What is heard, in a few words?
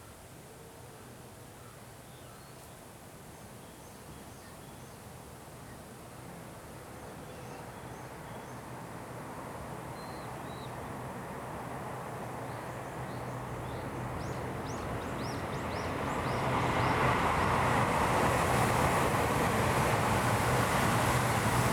Stereo, walk